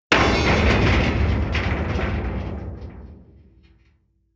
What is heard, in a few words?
hit big metal plate; whooshes rises